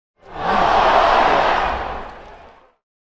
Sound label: Crowd in arena yells; Arenas